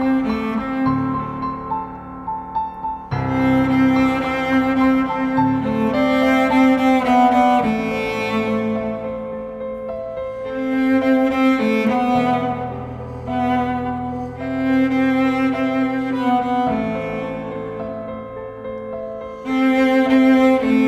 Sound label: For Cello and Piano